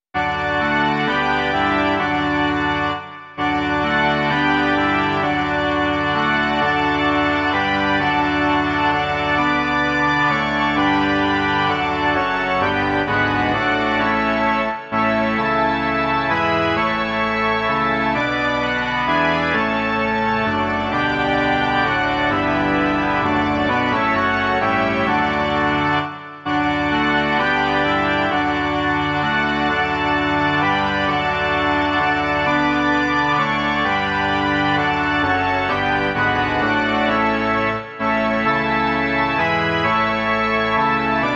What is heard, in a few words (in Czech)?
varhany